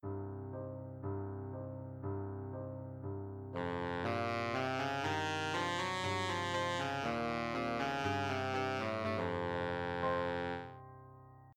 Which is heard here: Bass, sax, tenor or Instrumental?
sax